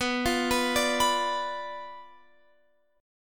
B Diminished B down Guitar Open F; Bdim arpeggiated

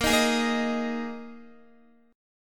Bb 7th Suspended 4th Bb Guitar 4E,4A,5D,5G,5C,6F; strummed

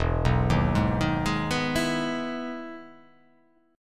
E Major 7th E down

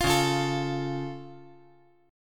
Db Augmented, down; strummed; JGuitar

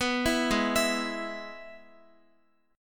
G sharp Minor Sharp 5th, Guitar; G#m#5 arpeggiated; x,x,9,9,8,11

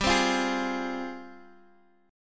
F Minor G, Fm/G chord; strummed; JGuitar

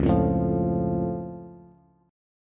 Db Augmented, down, Bass; strummed; JGuitar

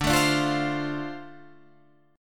D-Minor 7th D Guitar Half Step Down, chord

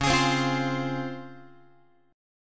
C sharp Major 7th, Guitar; C#M7 strummed; x,9,11,10,9,11; JGuitar

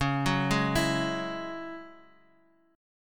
Ab 7th Suspended 4th Sharp 5th Db, Guitar

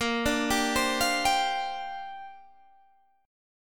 A sharp 6th Add 9th A sharp, Guitar Open G; down

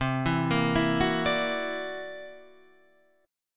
C 9th Flat 5th C, C 9th Flat 5th chord

